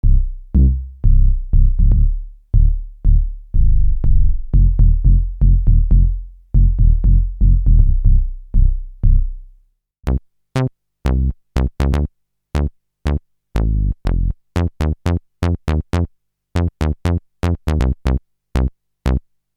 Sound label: Basses JX10